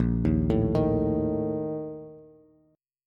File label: Bb Major 7th Suspended 4th, Bass; arpeggiated; JGuitar